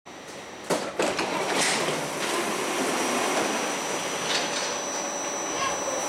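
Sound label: Metro G1 door open inside, Metro G1 døråbning Inderside; Emil Glentvor, Oktober 2022, Metro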